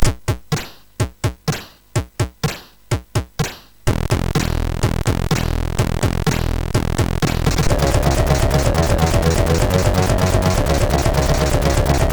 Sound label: Thrash Metal, MOS6581R4; sid